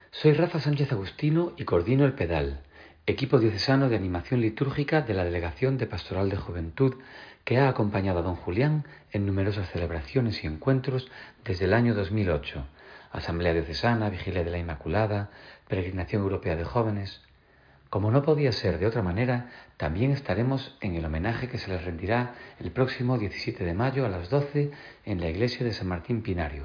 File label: pedal; rafa sanchez agustino